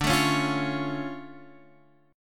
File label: D Minor Major 7th D down Guitar Open F; DmM7 strummed; JGuitar